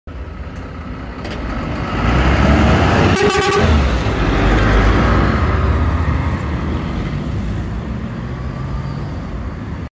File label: Tow truck; ماشین یدک کش